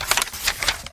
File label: Diary Open